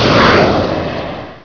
Missile; MPG-Net (USA); imperium

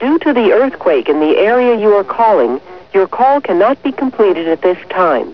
Earthquake

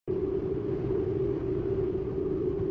Wind Slow; moonstone madness

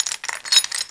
chain Rattle

